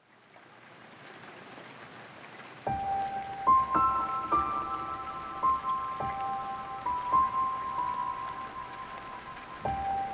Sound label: piano, rockabye baby with rain